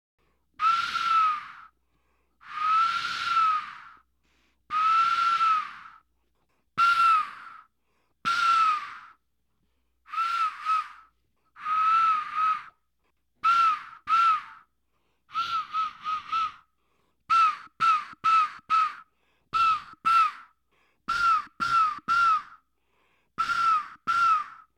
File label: solo